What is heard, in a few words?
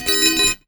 Baoding Balls